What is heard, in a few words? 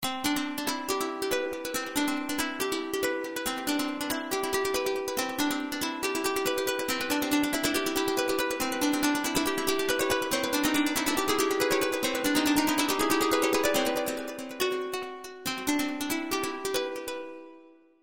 Guitar Sms